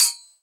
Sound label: Choke Cymbals; neuro; Optiv & BTK; Drums - One Shots